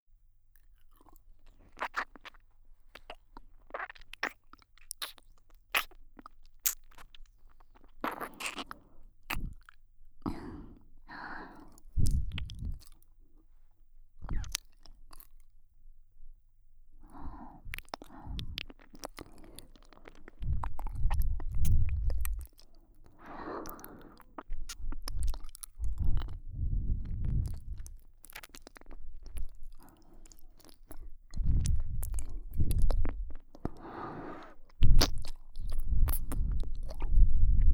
ドスケベお姉さんのねっとり耳舐め（左）, ASMR; 密着添い寝

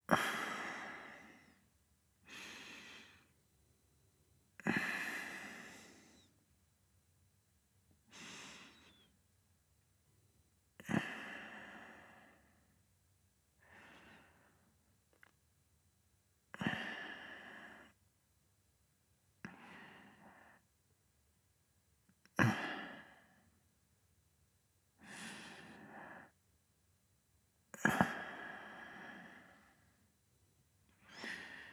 寝息; 寝息ボイス

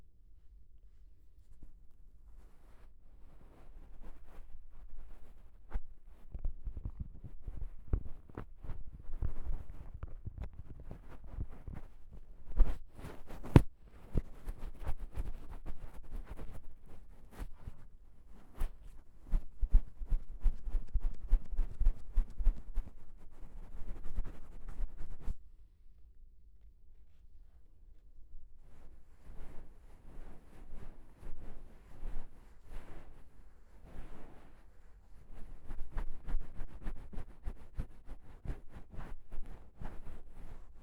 バイノーラルKU100耳ふわふわ; ASMR・お手軽〜高額バイノーラルマイクの差を調査隊!!

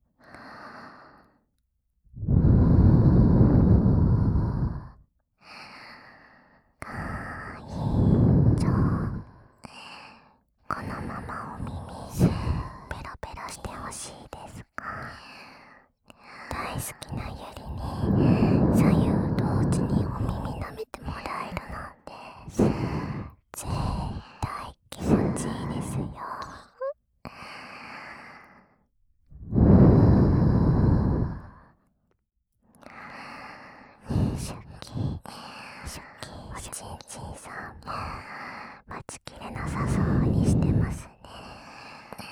夢の中で至福の7種両耳舐め, ASMR

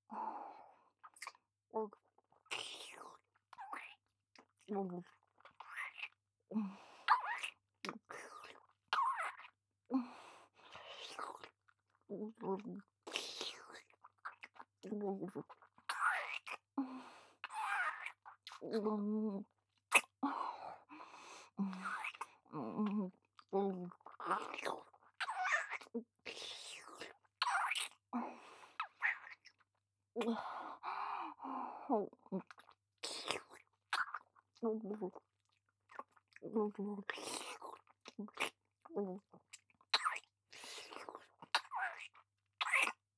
正面 ディープキス; ネモナ

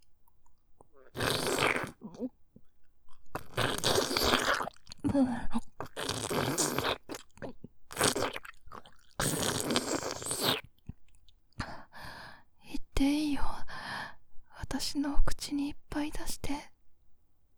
フェラ 音; 10秒いっていいよ私のお口にいっぱい出して

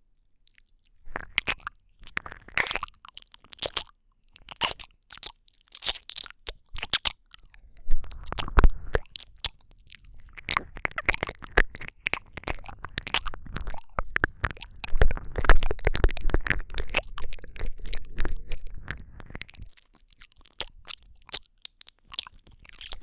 キスしながら舐め舐め(両耳同時ver)

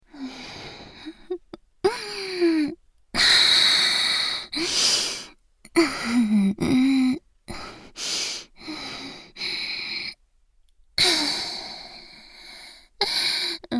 チン嗅ぎ; チン嗅ぎと裏筋舐め上げ

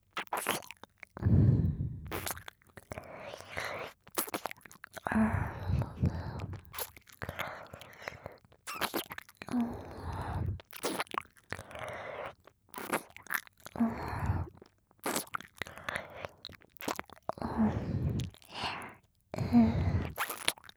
脳バグ まったり MIX 片耳, ASMR

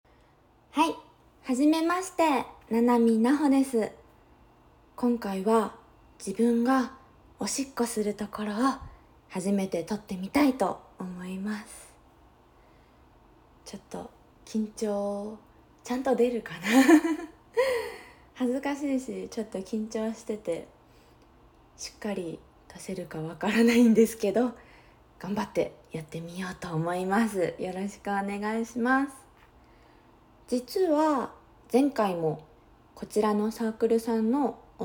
初ガチ実演オナニー; おしっこで濡れたぐちょぐちょのおまんこで絶頂オナニーする新人声優!「えっちな音ちゃんと聞いてね…」【ガチおしっこオナニー】

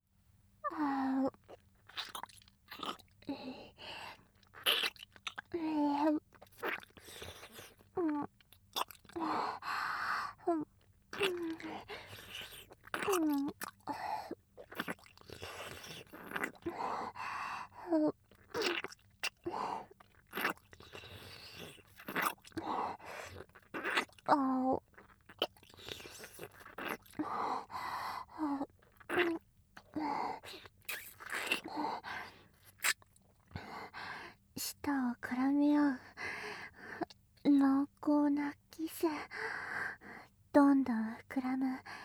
香りが誘う耳舐めセックス, ASMR

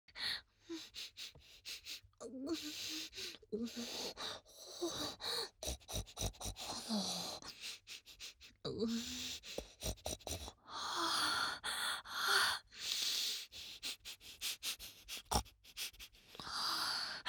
発情豚嗅ぎ; おっとり系人妻

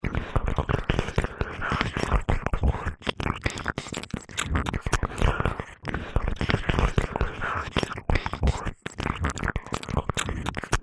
高速れろれろ両耳舐め 通常耳舐め

